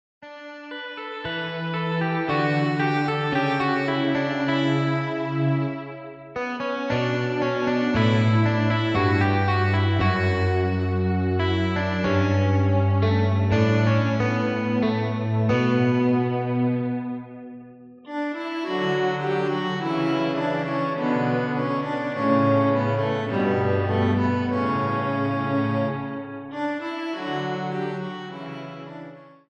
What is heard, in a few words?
sea storms, instrumental; spa; disco